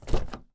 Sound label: car door open